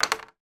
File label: food cellophane wrapped candy stick drop on table